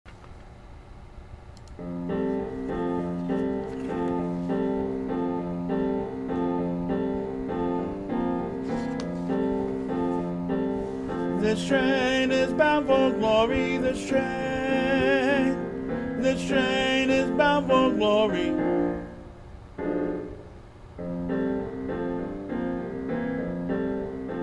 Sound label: this train tenor, choir